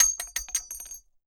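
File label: bullet case bounce 50bmg glass; gmod; viper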